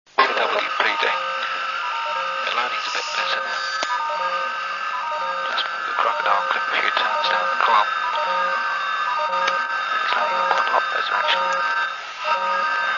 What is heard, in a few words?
BEACON